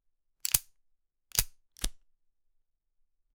airlock releases at the

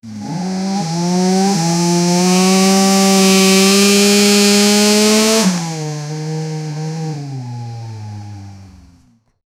bmw m3 revving; sportscar

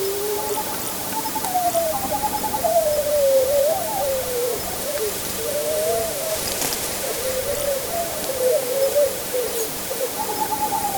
peaceful sounds of fields

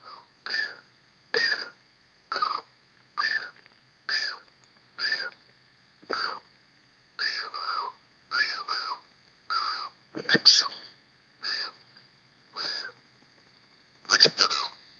male tickling his friend, playful

